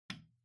Light Switch-Box-Rocker (Wall-Gentle)

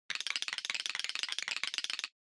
Motion Tremble Short

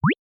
Motion Pop

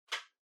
Portable MD Far Controller Unplug

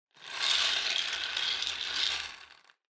Drawing Machine Garapon Spin

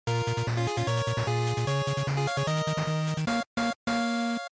GB Action Clear

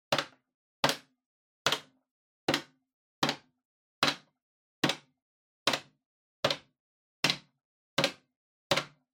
Public Phone Button Single Strong

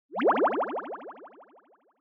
Fantasize High; 妄想08-3(高); シーン・妄想

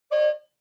Car Horn Fake

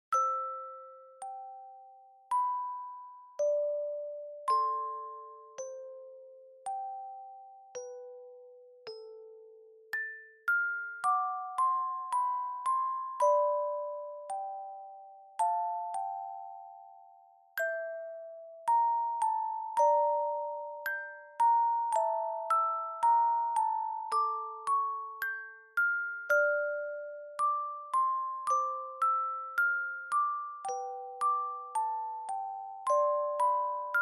Dry Slow

